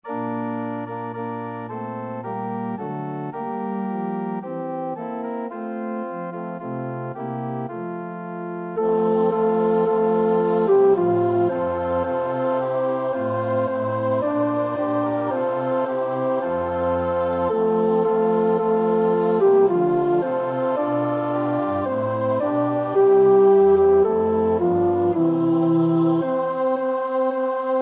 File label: tenor; Vesper 34; NLB 481; Hoor dé eng'len zingen d'eer